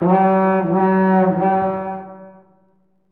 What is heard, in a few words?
horn; the lord of the rings the two towers; gc ps2 xbox